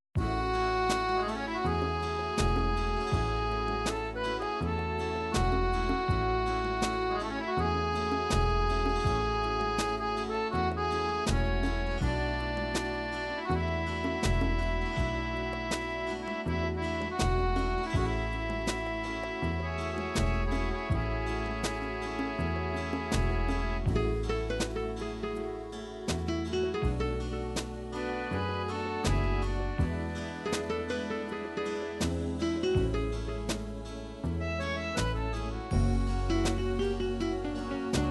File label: italian medley, midi